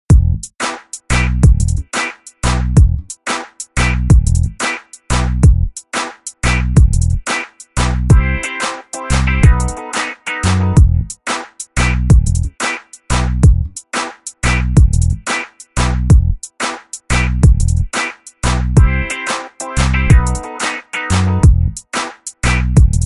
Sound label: Clap, hiphop